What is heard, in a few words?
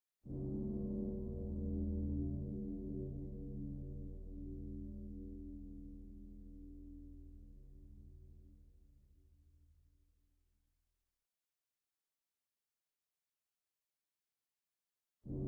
Gong slow